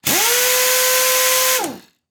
Industry Tool Run Half Inch Impact Wrench No Socket